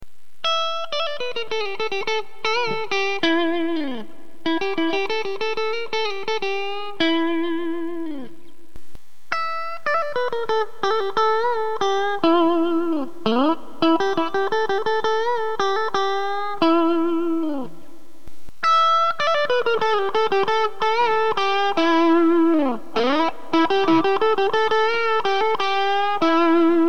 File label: Tweed OD Roll on